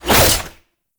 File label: Naginata Whoosh, Weapons Melee; Warframe; Koumei Naginata